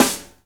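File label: snare drum funk; E-Lab; X Static Goldmine 2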